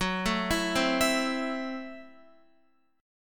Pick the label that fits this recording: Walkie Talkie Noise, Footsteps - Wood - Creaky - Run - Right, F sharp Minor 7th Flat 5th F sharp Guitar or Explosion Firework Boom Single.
F sharp Minor 7th Flat 5th F sharp Guitar